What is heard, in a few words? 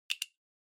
Stopwatch Mechanical Start Hand